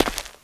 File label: Footstep Dirt; Guard